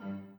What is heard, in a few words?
b piano